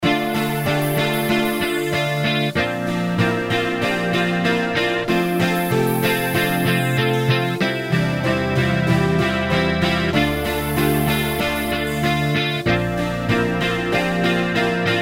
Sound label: 808 Guitar Full